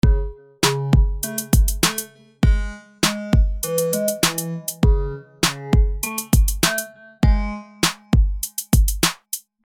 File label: bellz